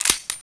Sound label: usp slide release; weapons